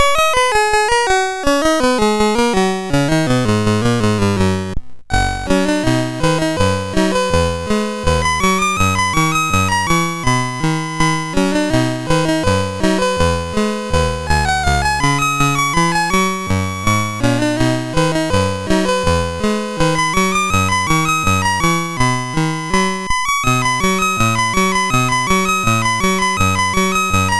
Poga sid MOS6581R3

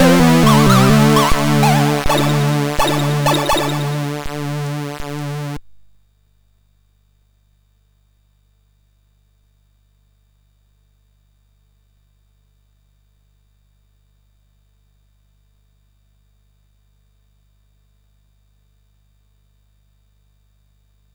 sid MOS6581R3; Abbing Frank; Dinorace 64

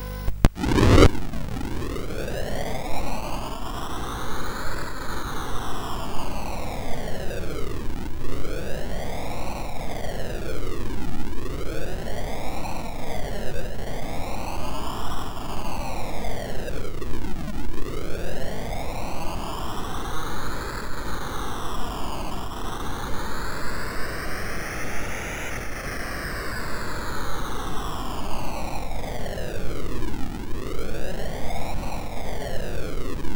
sid CSG8580R5; Frightmare

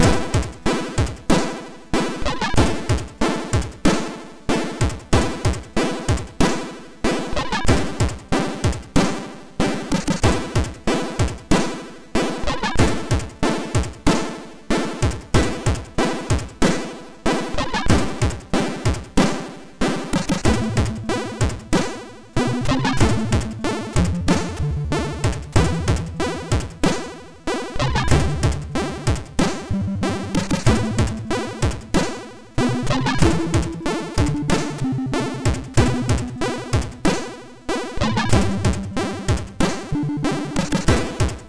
sid MOS6581R4

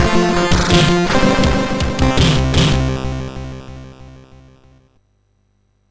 MOS6581R4, sid

